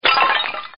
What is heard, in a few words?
break; Tradeskills